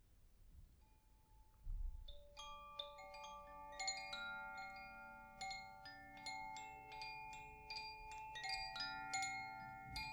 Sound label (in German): wasser koshi klangspiel